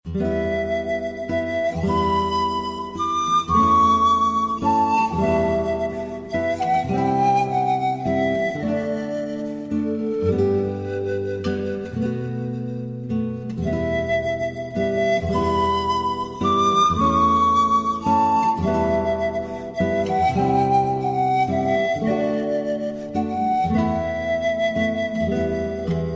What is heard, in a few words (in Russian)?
elfijskaya flejta